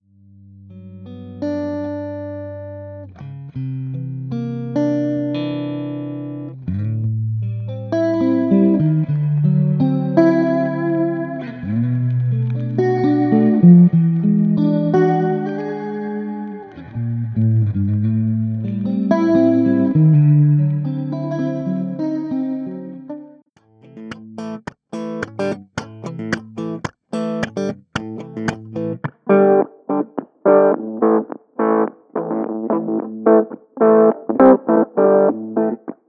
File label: guitars; RC-20 Retro Color